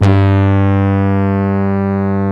HORN SECTION G; Greytsounds; Scott Peers Sound Engineering

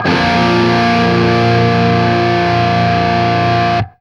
open A# cut, guitar; East West; Steve Stevens Guitar Samples Collection

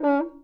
CUICA; Sonic Reality; Interactive Drum Kits

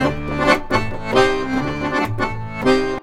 ACC, Balkanish Traditions

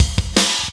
Fill, Drum N Bass Percussion Fills n Breaks

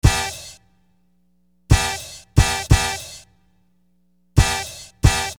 Horn Loop, Hip-Hop; Construction Kit; Sonic Foundry (Sony Creative Software)

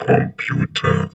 VOX VOX, Electro Vox

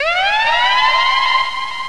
Siren classic; Urban Shakedown

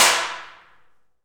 PRC ALMWA, Aluminum Wall; Rhythm Section; FX Perc

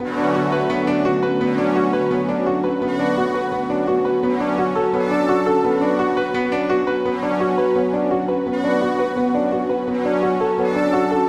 Synth Stringpia; Sonic Foundry (Sony Creative Software); Knutrix Drum & Bass

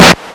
k1, Kawai K1 Drum Machines; 300 Drum Machines

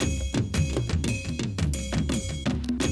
Classic DrumLoops

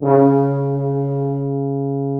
Orchestral Brass

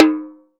Spok Tom; Roland SPD-S

